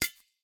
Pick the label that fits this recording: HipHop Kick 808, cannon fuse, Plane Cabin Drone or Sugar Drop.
Sugar Drop